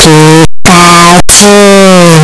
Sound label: pikachu cry; pokeyellow